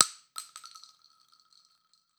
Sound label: casing 12ga; arccw; weapons